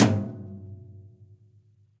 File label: Tom Brush Low Club Sizzle; drum hits; Ableton; Factory Packs; Session Drums Club; Standard Hits